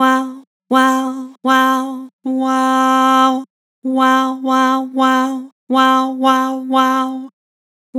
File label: Vibrato "Wow", Vocals; Harmor Vocals